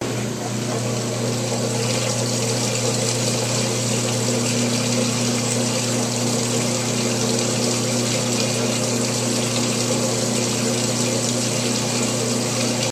washing; CosmicRage

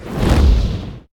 fire, combat, abilities, big claw

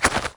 legs, wear

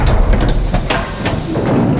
start docking, ships; CosmicRage

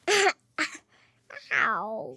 ouch; CosmicRage

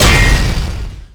blade slice; ships; combat

